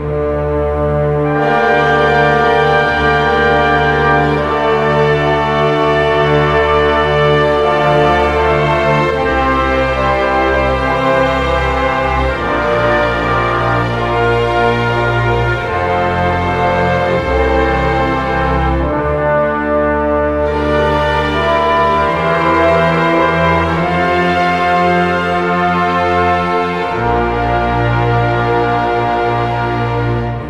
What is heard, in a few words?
LOADING; brothers in arms earned in blood